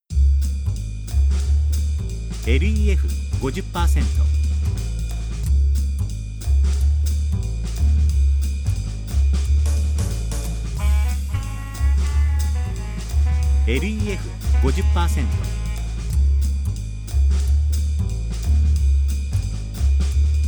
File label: jazz